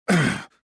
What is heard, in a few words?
land; jedi